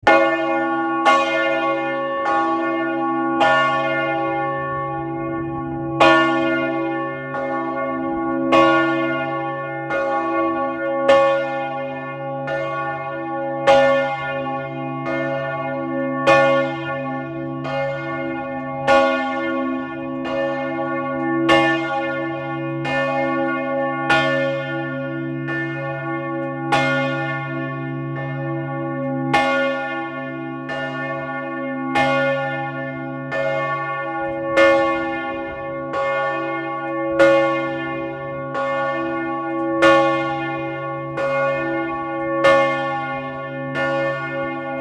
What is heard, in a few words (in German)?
Glockenklang Nikolai